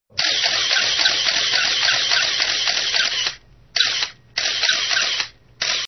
Appareil photo

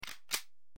Recharge

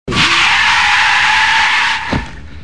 Taxi freinage

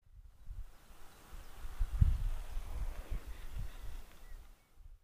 Velo

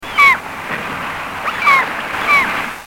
Mouette

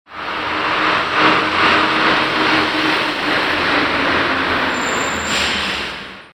Camion

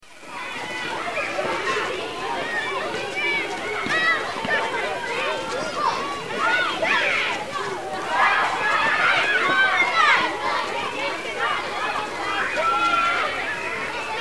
Recreation

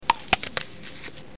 Pomme

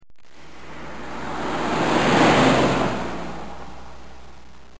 Voiture

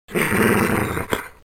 Lion

